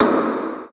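Wooden Pillar Hallway Snare, Music Hall Percussion; Aberdeen Music Hall; Wooden Door BD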